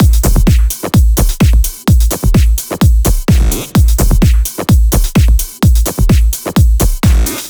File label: 128BPM Air Raid Kit